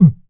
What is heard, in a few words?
Beat Box Kick; pitchglitch samples